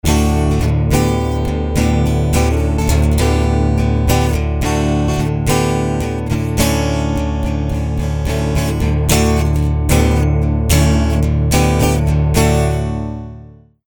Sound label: dropped d, Alt Tunings